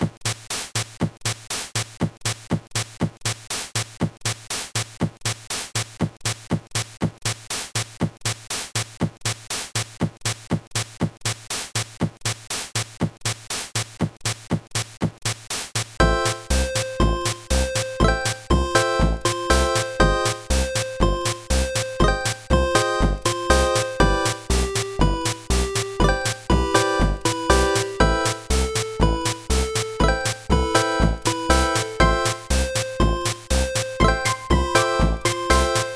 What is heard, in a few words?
Arcade Type Song; Toad